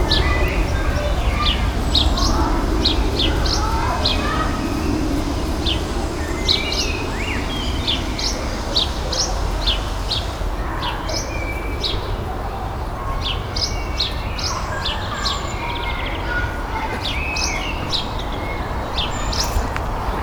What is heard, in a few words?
City Garden Birdsong; Animals